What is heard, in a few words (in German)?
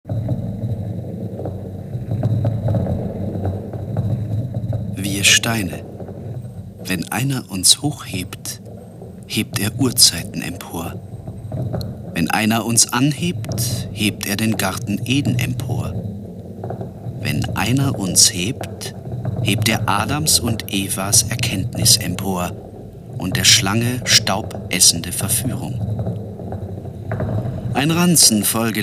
Chor der Steine